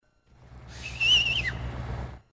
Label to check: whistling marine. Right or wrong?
Right